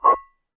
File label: Slab, Found Percussion Hits; ModeAudio Found Percussion Hits